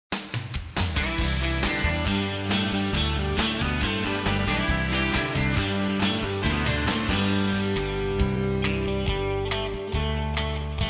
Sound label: Pop Country; on hold message music